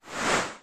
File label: drag the cloth; operation